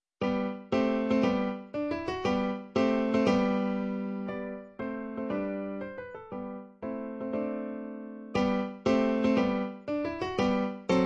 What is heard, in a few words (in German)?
Fanfare, Klavier